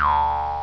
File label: plop zone